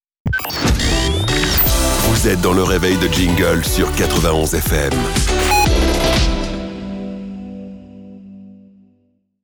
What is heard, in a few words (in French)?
Sweep; 91 FM; Reveil de Jingle